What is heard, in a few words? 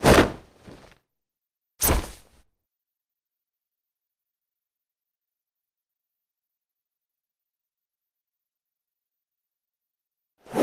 transform; Amara